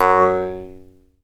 JEWS HARP, Earth Tones; Jew's Harp & Spoons

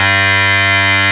rave beep G1; Best Service Alpha Dance vol.2

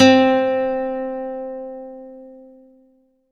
C3, CLUB ORGAN; Roland JV 2080

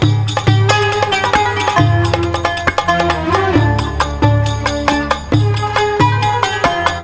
Oiental; Best Service; Ethnic Journey Sound Cube

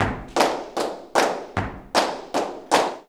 JALEO TAP, Flamenco

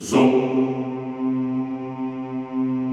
CHO C2#; Sound & Vision; Gigapack I CD 2; CHO Soumm+Houuu

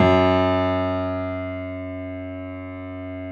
JAZZ F#1, Keyboards Acoustic; USB Soundscan vol.17

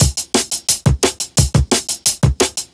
classic dnb breaks, A, 175; Skul

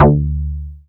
BAS TX-802; Best Service Gigapack 2

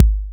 TR-808 Kick

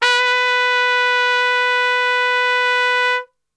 B, Brass; Best Service; Brass Super Section; TRP STRGHT

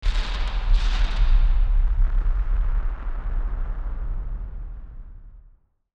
Firework FX; musicradar; bomb factory

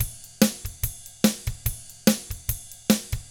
ROCK, Drums; ILIO; Double Platinum Drums 1; 145 ROCK

